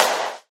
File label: 80s Snare; Drums